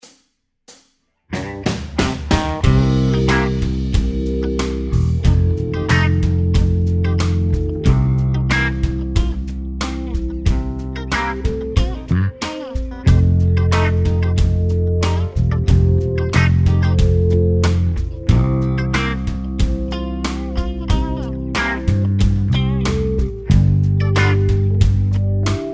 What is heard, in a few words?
Playback improvisation